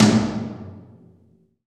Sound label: TOM TOM, Roland